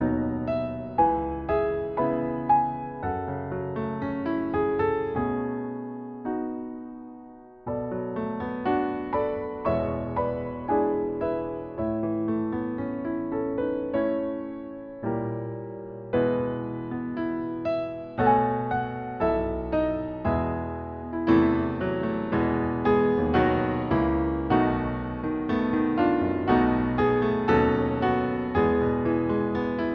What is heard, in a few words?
Instrumental